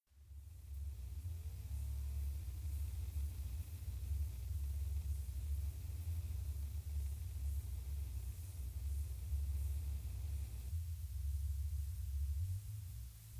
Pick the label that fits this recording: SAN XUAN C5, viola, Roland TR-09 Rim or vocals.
vocals